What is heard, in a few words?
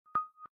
Zero Clickr Ready; Samsung Galaxy Tab E 7.0 SM-T116BU